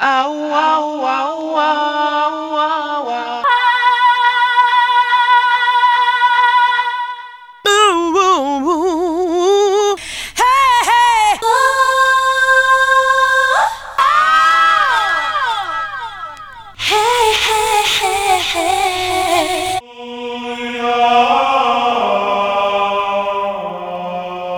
vocal; FutureMusic

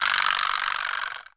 VIBRASLAP, AFRICAN SET; Voice Crystal - Steve Reid Definitive Percussion

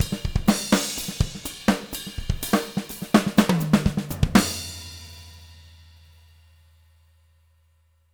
124 END, Living Drums

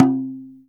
GENDANG D4F, GENDANG DRUM; Spectrasonics; Heart of Asia

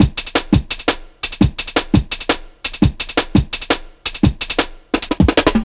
Swift Beat, Drum & Bass, Beats & Breaks

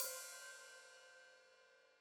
Hat Open, Hi-Hat; Deeply Sampled Drums

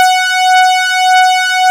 LEAD, Nord; Wizoo - Powered Nord; LEAD PAD HRN

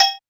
technox perc; Quasimidi Technox

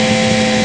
The Engine; Amiga Soundtracker sample

